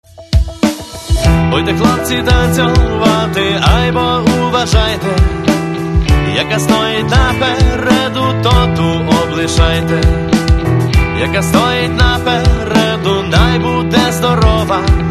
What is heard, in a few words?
rock